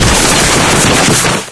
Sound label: dig down, antlion; Source Engine; video game; npc